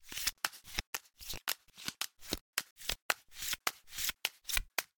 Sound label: card shuffle